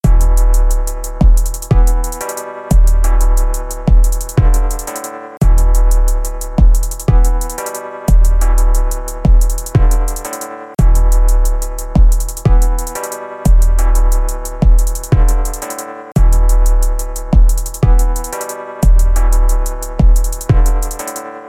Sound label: HipHop Instrumental